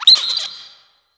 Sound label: Monkey; moul-assets